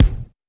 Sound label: kickdrum d sharp key; one shot